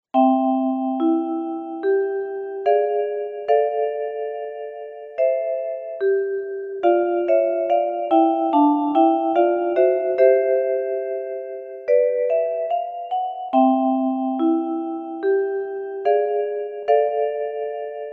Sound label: Solo, klassik